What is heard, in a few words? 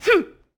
Boxing Female Punch Grunt